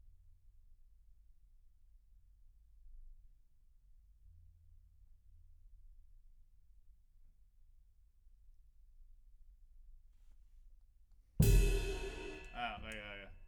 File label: Kit